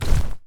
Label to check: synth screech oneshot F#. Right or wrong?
Wrong